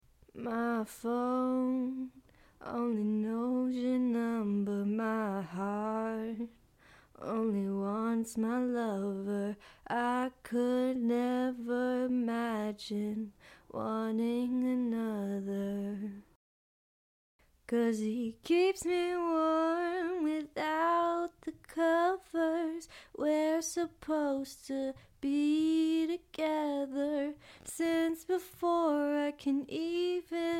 pop 105 Bbm, acapella

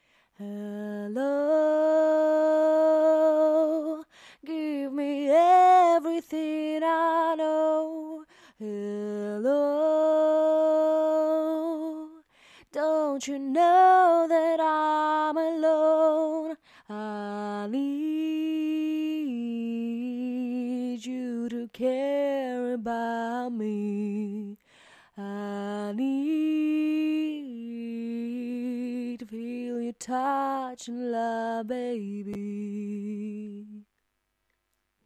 acapella, Rock 116 BM

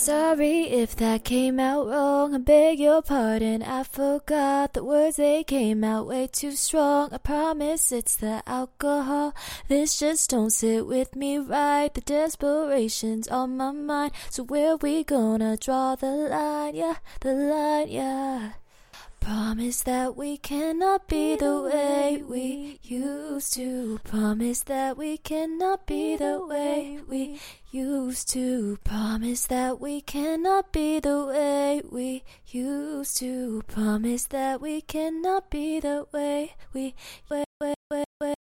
EDM pop 100 Dm, acapella

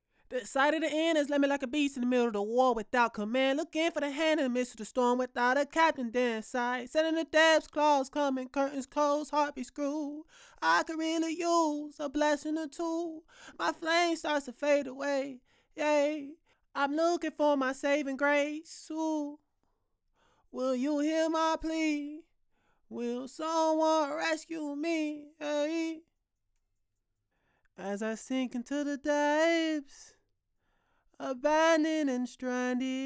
acapella, HH 150 Am

